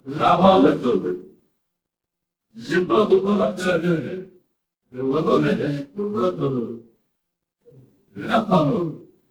crowd gasp

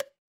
cartoon bonk

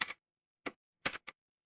ui typing

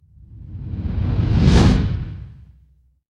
fire electric whoosh flame

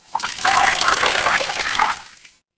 water swirl

